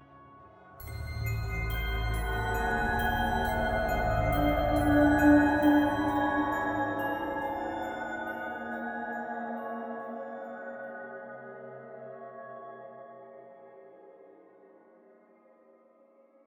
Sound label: Music Suspense